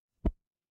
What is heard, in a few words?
ui send